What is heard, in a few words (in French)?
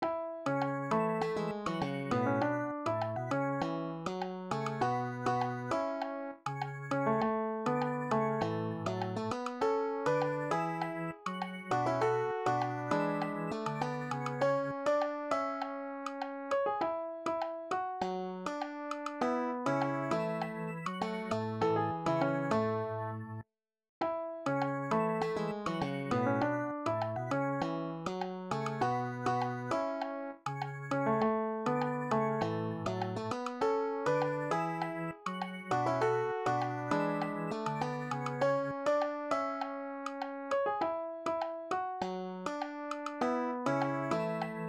melodie